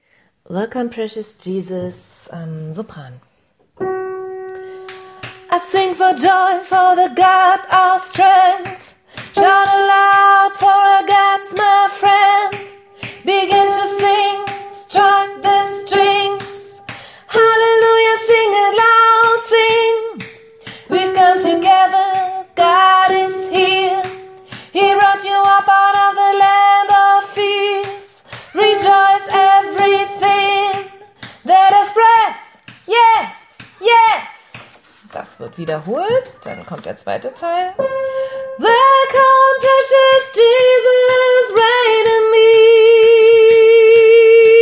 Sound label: sopran